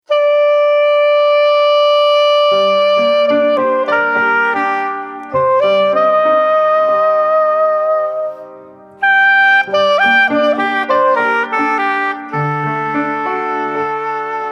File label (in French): Intro musique